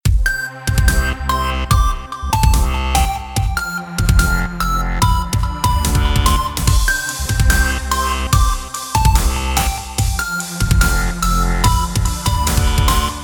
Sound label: ringtone; xiaomi